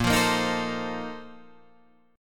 A sharp Major 7th Suspended 2nd Suspended 4th, Guitar Open G